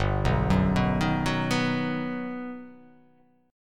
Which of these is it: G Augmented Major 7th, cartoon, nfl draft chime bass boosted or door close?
G Augmented Major 7th